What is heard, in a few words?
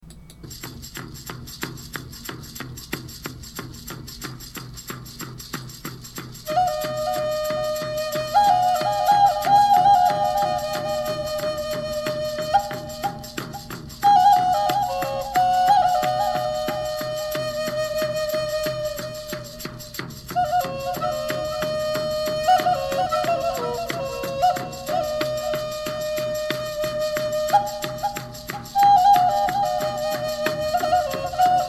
Rhythm of Strength